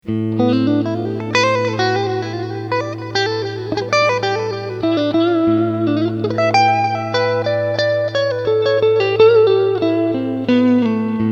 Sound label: SansAmp Clean Delay